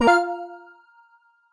Jingle Arrival Expert

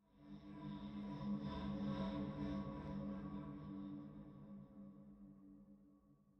Ghost Pad

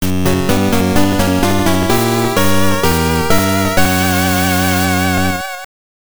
Unused Jingle; mega man v rockman world 5 gameboy 1994 gamerip

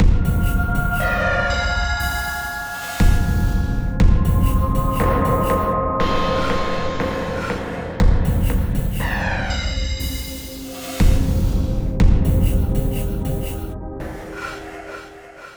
Success Tonal A4; Insanely Twisted Shadow Planet

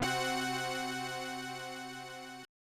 Title Jingle; Space Invaders; SNES

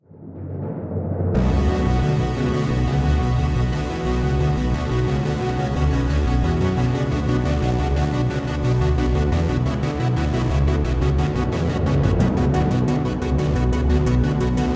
Battle (Low); mass effect 2; Haestrom

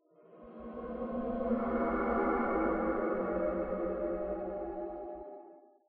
Diving Music Texture; The Sinking City

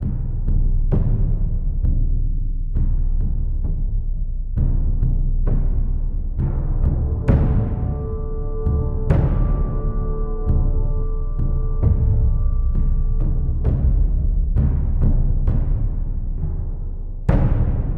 Loop Boot

